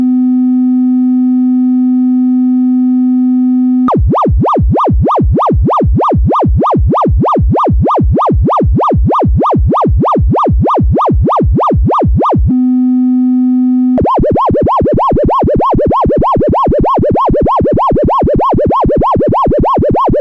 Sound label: slow log lin fm